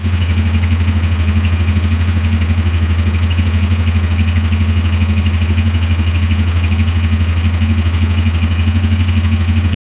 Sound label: old fiat 127 style car; vehicle